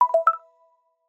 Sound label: ALERT JOURNEY COMPLETE